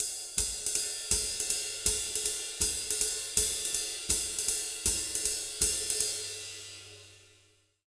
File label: 160 SWING, Jazz Latin Drumloops; USB Soundscan vol.08; AKAI